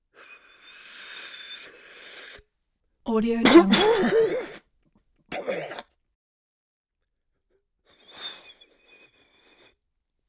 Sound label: drug pipe smoke inhale drag hit bong; prosoundfx